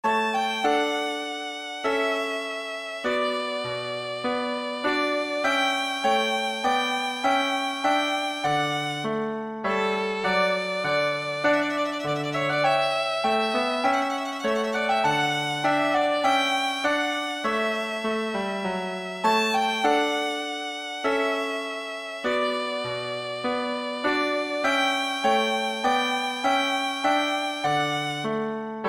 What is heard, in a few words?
march, violin